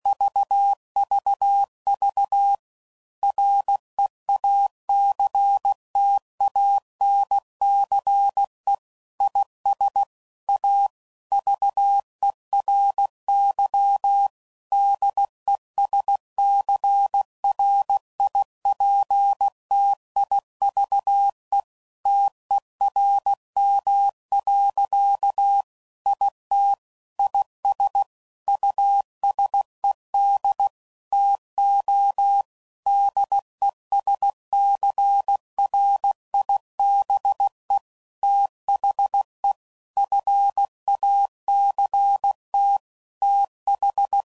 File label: Reactance, CW Code Groups 15WPM Technical Subjects; Morse Code; HamRadio